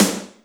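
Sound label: Snare Gunner, Alesis D4 drum machine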